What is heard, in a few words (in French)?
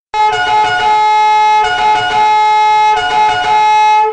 son pompier italien, klaxon